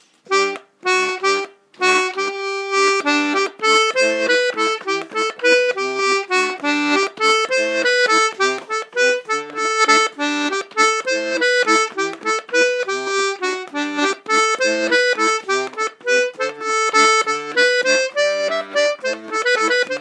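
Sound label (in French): bourree, accordéon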